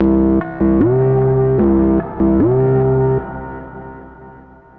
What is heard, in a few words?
Anal Bass; Zero-G Smoke